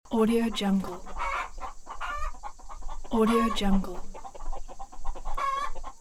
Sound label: chicken coop ambience